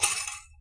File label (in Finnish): korkki, Clangy Crunchy Foundsound Kit